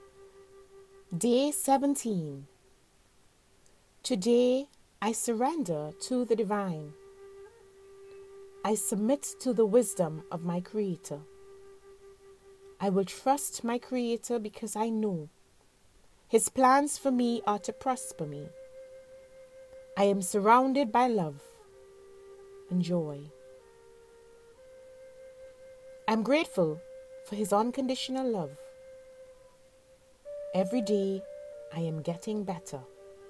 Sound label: healing mantra